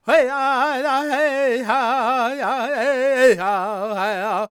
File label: C# MIN, World Voices, LAKOTA